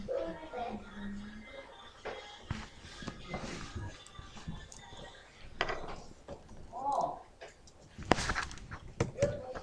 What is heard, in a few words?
seagull